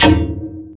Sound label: SYNTH SD, DANCE KIT